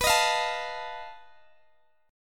B 7th Flat 5th B Guitar DADGAD

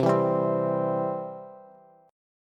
C Major C down Bass; strummed; chord; JGuitar

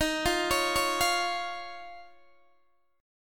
D sharp 7th Suspended 2nd, Guitar, DADGAD

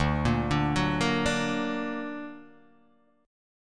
D Augmented Guitar Open D, chord

